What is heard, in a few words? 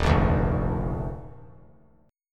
Eb Major 7th Suspended 2nd Eb 3C 3F 3A 3D; EbM7sus2; strummed; JGuitar